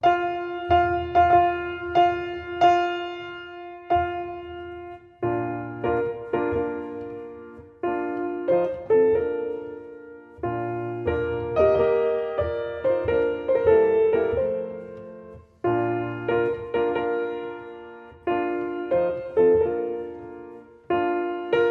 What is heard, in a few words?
Bridal Chorus (piano solo faster version)